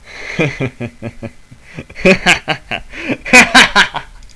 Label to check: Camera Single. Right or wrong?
Wrong